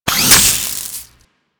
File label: Drone Inject; Warframe; Vay Hek